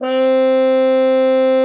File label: CUP MUTE; C4; Xsample VOL-3